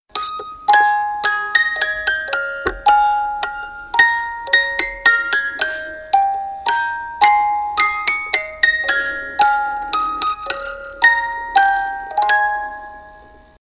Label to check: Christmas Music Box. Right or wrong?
Right